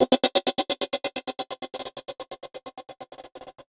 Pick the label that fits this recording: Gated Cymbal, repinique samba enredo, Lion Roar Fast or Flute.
Gated Cymbal